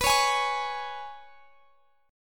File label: Ab Minor Double Flat 5th B